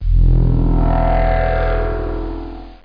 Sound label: Shield; lamsword